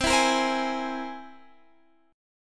C Augmented 7th, Guitar, Standard; strummed; JGuitar

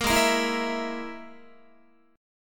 G sharp Suspended 2nd Sharp 5th, Guitar; G#sus2#5; strummed; JGuitar

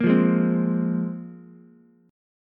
Bb 7th Suspended 2nd Bb Ukulele, chord; Bb7sus2 strummed

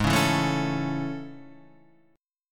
A Major Ab; strummed; JGuitar

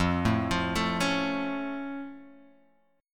F Augmented F Guitar Half Step Down

